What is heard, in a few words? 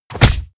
stylized fist punch